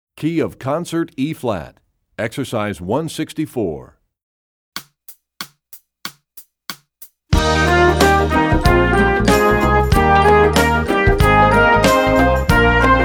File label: Studies Concert Eb; EE2000 Book2; Rubank